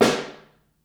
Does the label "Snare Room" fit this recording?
Yes